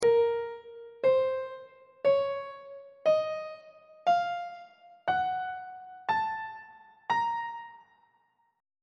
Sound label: b flat harmonic minor scale note positions on treble clef